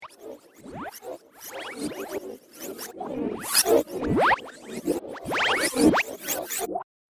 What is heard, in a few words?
Weird Robotica, science fiction